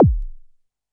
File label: korg trident